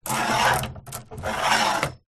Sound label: household clothes hangers plastic slide on metal rail